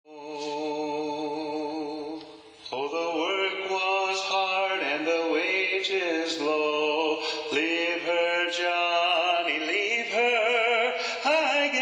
Leave Her Johnny, Leave Her (Tenor Predominant, sea shanties